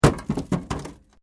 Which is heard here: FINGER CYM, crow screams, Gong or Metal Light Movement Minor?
Metal Light Movement Minor